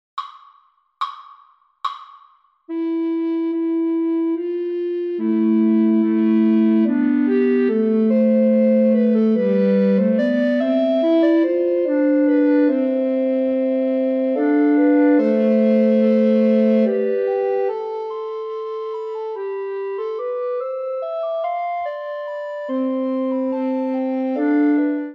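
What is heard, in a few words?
Kyrie Christe Kyrie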